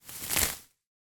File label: foley grass long weeds pull from ground